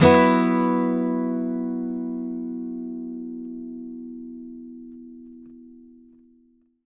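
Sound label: acoustic guitar B sus down, Whole Lotta Country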